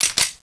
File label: fiveseven slide release, weapons